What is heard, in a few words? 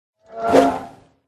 haosa attack voice bottom